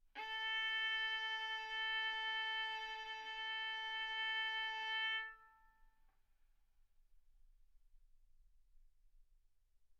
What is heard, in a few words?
Violin arco A4 B4; mf; sul A; stereo